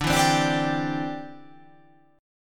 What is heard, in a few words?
D 7th Suspended 2nd Suspended 4th D